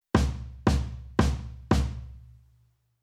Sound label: Jazz Stick BD, Rhythm